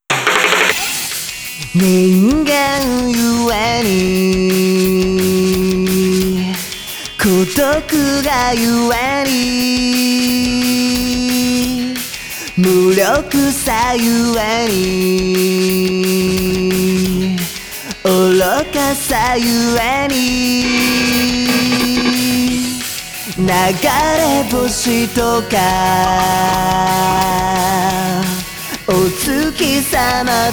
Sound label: Acapella; Gintama